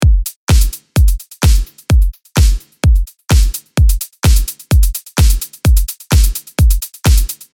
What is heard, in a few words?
kick clap hat delay